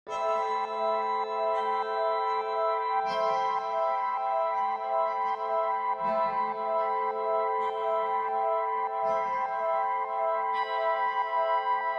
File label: ambient